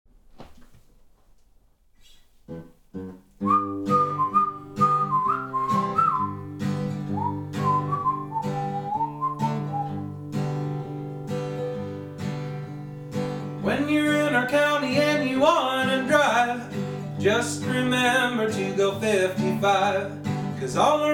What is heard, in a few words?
Cops With Banjos